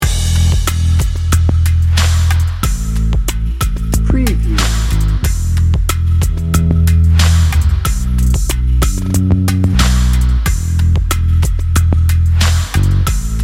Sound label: velvet midnight dnb